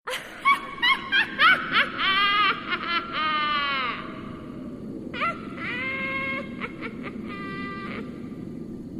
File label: witch cackle